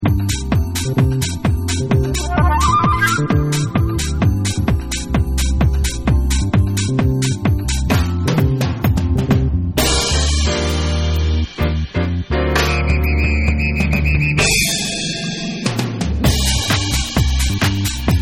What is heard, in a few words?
Extended Dance Version Instrumental